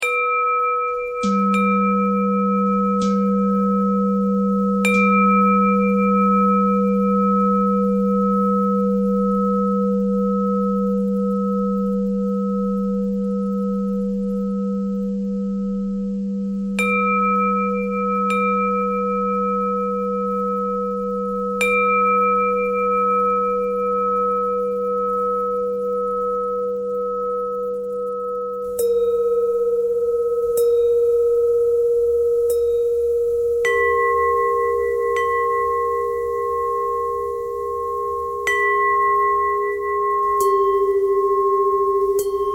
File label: bell thread pentatonic